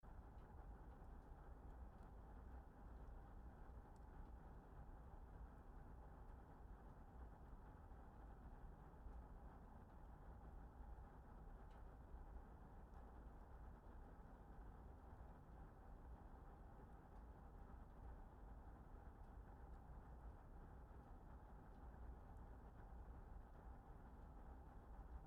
wind drawn into fire

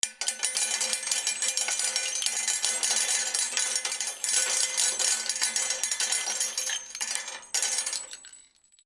shell casings poured on metal

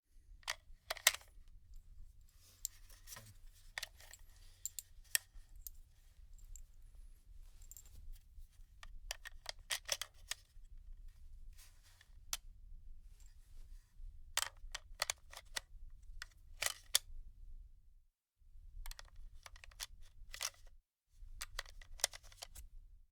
load magazine single bullets

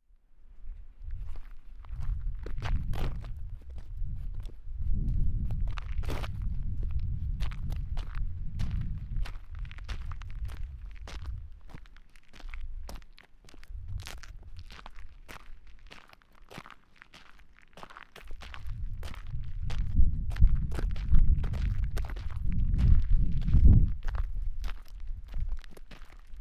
walking on gravel

